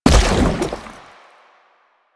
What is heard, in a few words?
Step Default, Footsteps